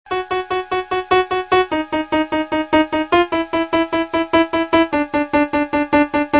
piano

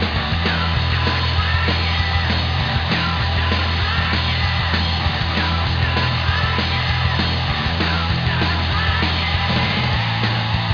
Crying

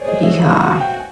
yeeha

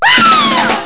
scream

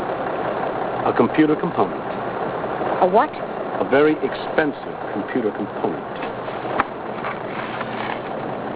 computer component

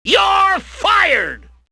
fired

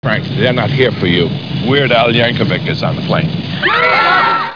plane

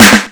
snare

